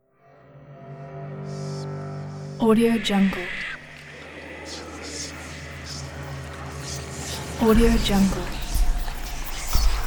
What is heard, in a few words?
evil strings whispering voices